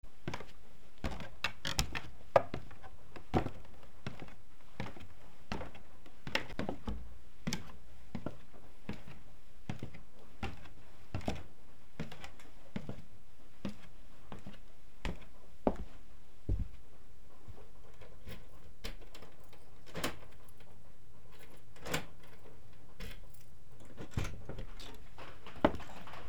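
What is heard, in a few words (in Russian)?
стук каблуков по деревянной лестнице звон ключей стук дождя по крыше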